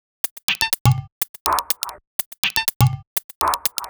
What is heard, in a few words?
Top 123bpm, House Drumloops